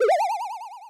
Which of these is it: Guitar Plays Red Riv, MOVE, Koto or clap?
MOVE